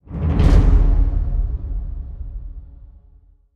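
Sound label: sting, drum enemy cleared; transformers war for cybertron gamerip; IACON INVASION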